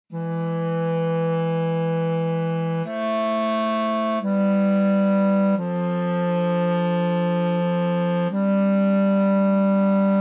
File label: O bone Jesu bass